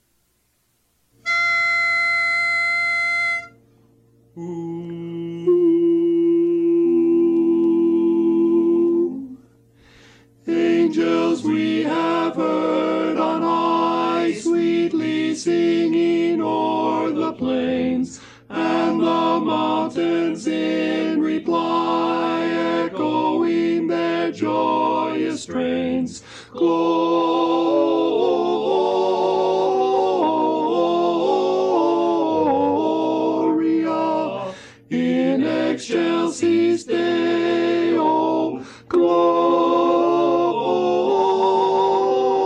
Tenor

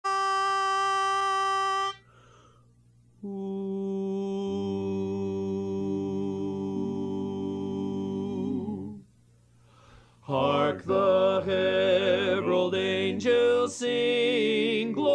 Hark The Herald Angels Sing Lead

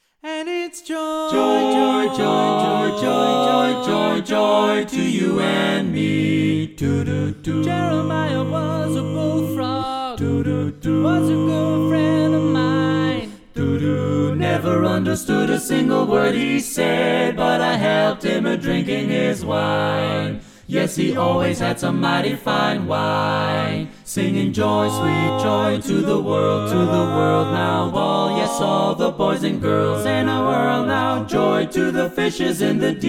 Baritone

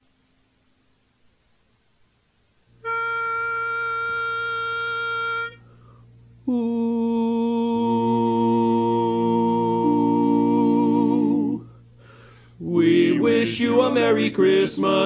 Lead

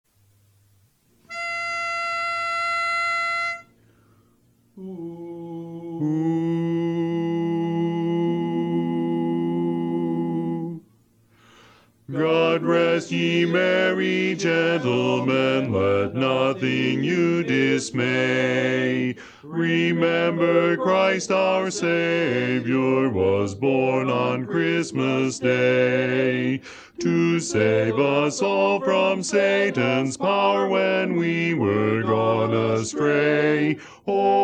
Bass

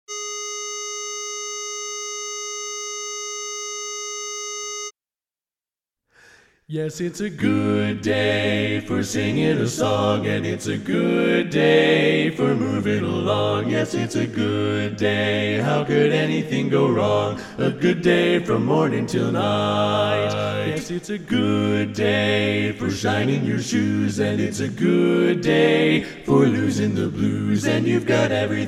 Bass

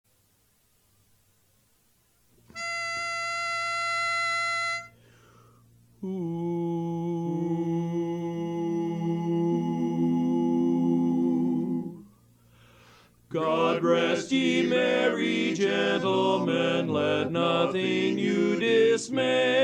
God Rest Ye Merry Gentlemen Lead

